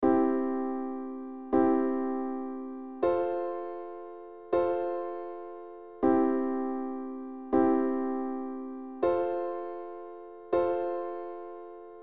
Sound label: I I IV IV, Progression Accords